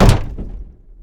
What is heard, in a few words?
footsteps land auto; player